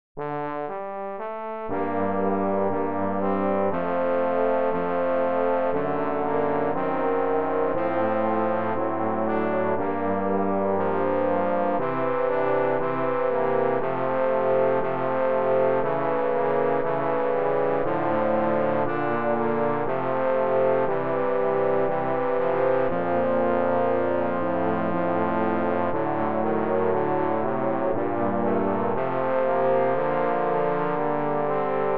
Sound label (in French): trio pour trombones avec accompagnement